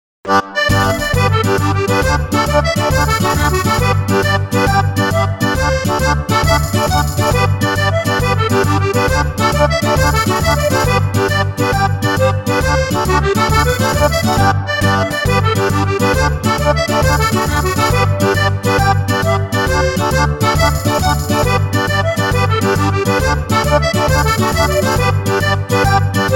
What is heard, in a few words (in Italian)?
Tarantella; Tarantella dei compari